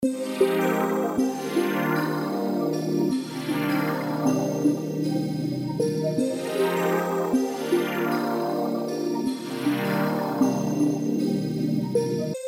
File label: Sunset 156 C minor melodic